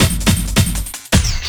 LOOP SD, Drum & Bass, 160 BPM

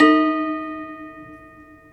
Prepared Piano; Battery 3 Sample Kits

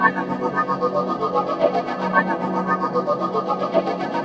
Jujupad; Sonic Foundry (Sony Creative Software); Ugly Remnants VOL-2; Knutrix Demo

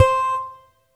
ART GUITAR C4; Best Service Dream Experience

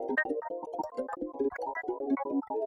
arp grains

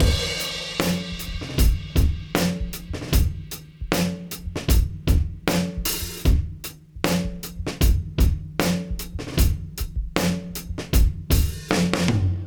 Beat Loops, 077; Zero-G; Monster Beats

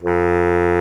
BARI SAX F2; Greytsounds VOL-1

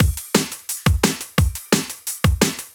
DNB BREAK

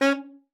C#4, alto sax; Xsample VOL-2

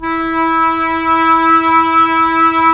Cor Oboe; Roland - LA Composer vol. 4

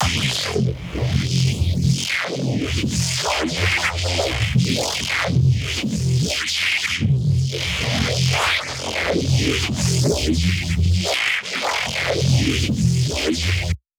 NEURO SQUELCH, bass

